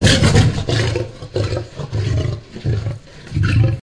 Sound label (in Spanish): Sonidos De Leon